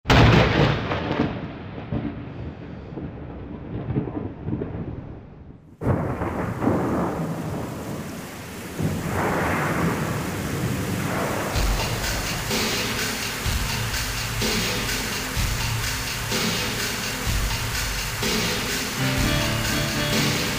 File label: can hear music